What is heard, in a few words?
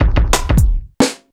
dnb; Loopasonic